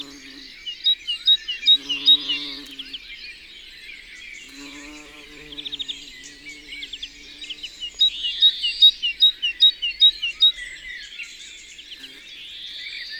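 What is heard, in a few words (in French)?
Mesange charbonniere, Drome; Concerts Naturels de la Drome; Studio les Trois Becs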